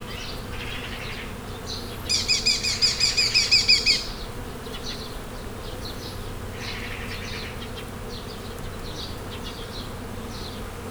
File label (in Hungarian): kék vércse; Görestanya